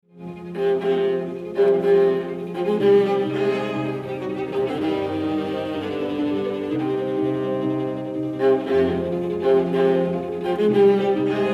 Quintet for Piano 2 Violins Viola and Cello In Eb Minor; II. In Modo d'una Marcia.; Schumann; Op.44